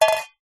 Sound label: ball bearing metal small set down on metal pot base; industrial